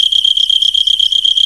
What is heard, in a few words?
CRICKETS, JUNGLE; Spectrasonics; Heart of Africa 1; JUNGLE FX